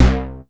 arpeggio; Masterbits; Vintage Voltage; SEQ-FX

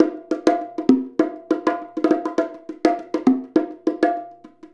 Bongo; Essential Percussion

